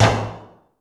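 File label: GATE tom; Crypton - DrumBase5000; GATE KITS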